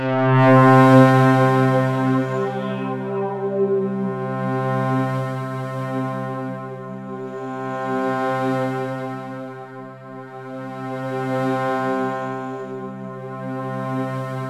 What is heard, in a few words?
DEEP ATMOS, KURZWEIL K2000